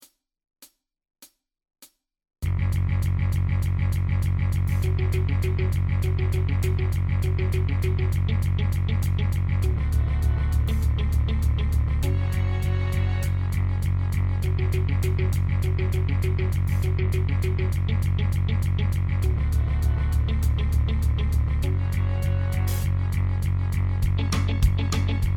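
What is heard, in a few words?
VLC karaoke, Cello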